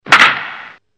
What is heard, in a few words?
Baseball Wood Bat